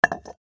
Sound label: bottle put down